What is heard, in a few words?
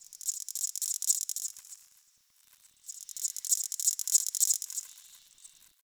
Cricket; Warframe; Tau